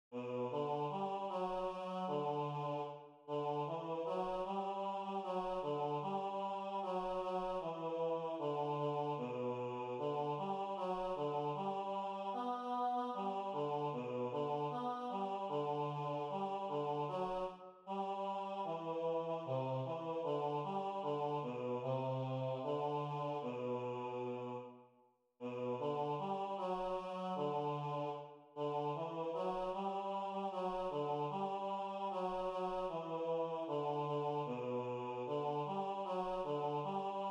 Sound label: Baritone Bass